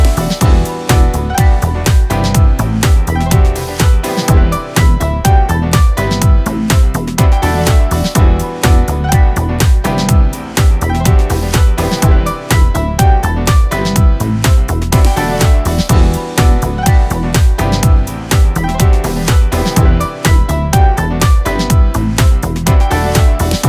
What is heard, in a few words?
house electronic techno